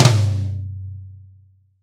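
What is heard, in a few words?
Reg. Low Tom, Roland BK-5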